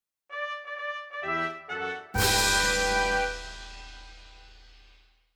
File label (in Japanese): ファンファーレ